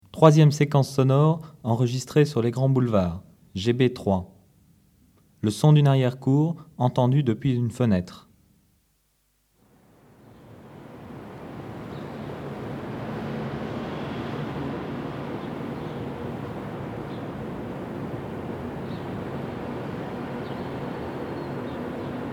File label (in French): arriere cours